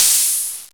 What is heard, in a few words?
Hat, Dubstep One-Shot Drums